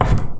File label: Furniture